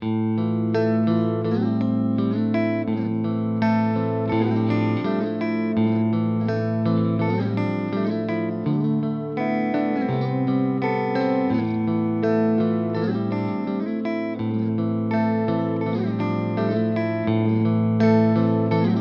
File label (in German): Ibanez RG Clean Zwischenposition Neck